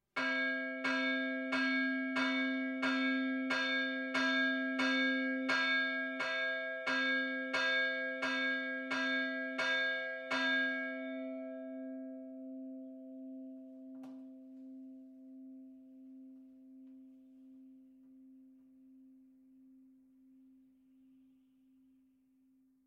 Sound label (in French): tocsin; campanologie; stpierre